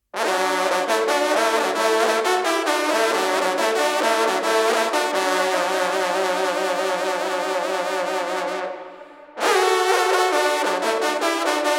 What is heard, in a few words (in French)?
Chevreuil Duo, trompes